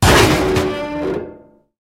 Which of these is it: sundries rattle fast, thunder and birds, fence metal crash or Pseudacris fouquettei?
fence metal crash